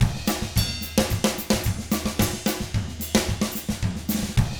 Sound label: 110 SAMBA, Drums; ILIO; Double Platinum Drums 1